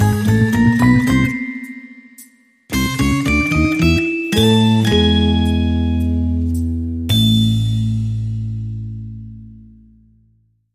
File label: Sting Mysterious